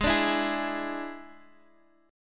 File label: D Suspended 2nd Flat 5th Ab, guitar